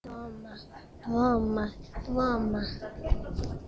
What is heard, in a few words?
drum